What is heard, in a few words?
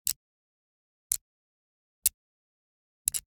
Generic Click Plastic Tiny; boom library; The Interface